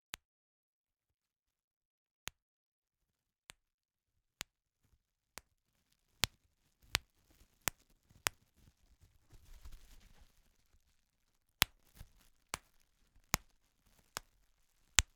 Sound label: Bubblewrap